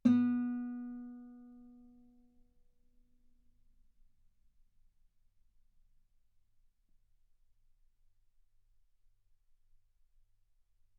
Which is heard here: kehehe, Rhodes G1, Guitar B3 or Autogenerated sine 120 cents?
Guitar B3